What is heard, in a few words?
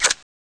scout clip out; cstrike; diliulsound